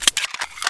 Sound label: clip out